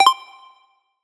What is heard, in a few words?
Collectibles Classic FX; Cymatics; Video Game Sample Pack Vol 1